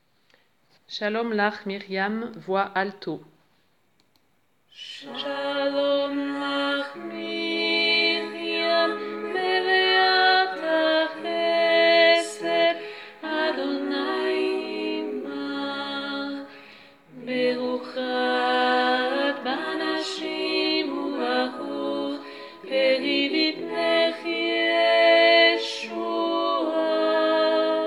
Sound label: alto